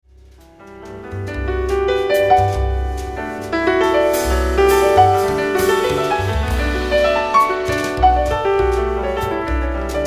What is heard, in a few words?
bass